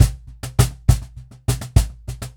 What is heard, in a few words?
Cajon Loop 100BPM; musicradar